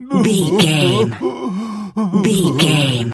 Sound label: voice male cry whimper